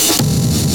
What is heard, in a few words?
Freeze